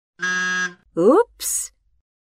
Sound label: wrong